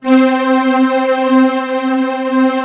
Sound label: OCT.STR. C3, YAMAHA, Analog Fantasies; USB Soundscan vol.49; AKAI